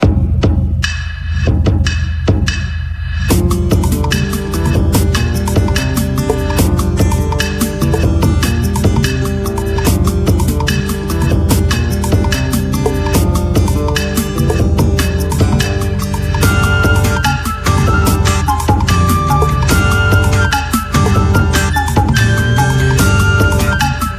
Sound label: jingle map